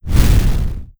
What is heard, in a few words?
explosion; NIIIEMAND's explosion sfx